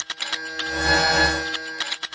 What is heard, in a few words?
resonant hum loop; LOOPING AMBIENCE